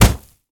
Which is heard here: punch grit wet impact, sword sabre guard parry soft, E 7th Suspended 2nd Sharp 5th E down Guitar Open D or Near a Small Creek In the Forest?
punch grit wet impact